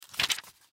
Manual Turn Page Single Book Pamphlet; Paper; Office; Materials; Blastwave

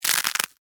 Texture, Crunch, Cereal, Honey, Oats; Crunches (Sound Effects:Objects); SoundEffects-BlastWave

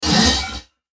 Hit Transition Electrical Device; Blastwave

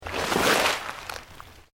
PLANT LEAVES LARGE MOVE FAST, rustling; Material components for constructing more complex sounds

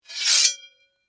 Sword, Scrape, Blade, Metal; Warfare; Combat

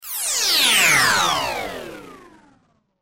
Sci Fi Power Down Malfunction Engine Shut Off; Spacecraft

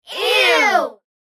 Kids Ewww; Human; Talking Sound Effects; BlastWave

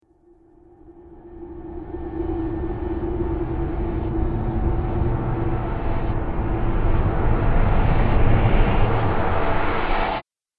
Horror Movie Atmospheric Transition; Scary Soundscape Sound Effects

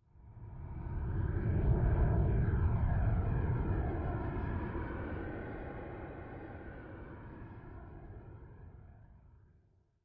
Windy, Dark windy background.; Scary Soundscape Sound Effects; Sound Effects:Horror

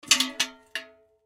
Paint Can Empty Pick Up; Pick Ups; Industry; Sound Effects:Objects; Blastwave

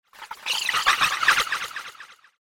Liquid Alien Chatter, alien sound effect; science fiction sound effect; Andrew Fleming

